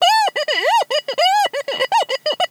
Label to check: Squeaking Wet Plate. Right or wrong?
Right